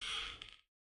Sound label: creak